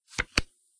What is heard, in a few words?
send card